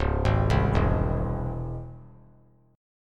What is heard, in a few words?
C sharp Minor Major 7th C sharp, chord; C#mM7 arpeggiated; 1,3,3,2; JGuitar